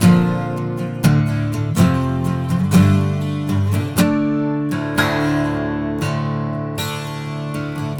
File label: 12 String Guitar; Stringed Instruments; Acoustic Guitars